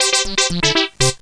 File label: player dead